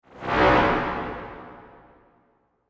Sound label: Whoosh Transition Sci Fi Materialize Resonant, Whoosh Transition Materialize Resonant Distortion; Sound Design; Whooshes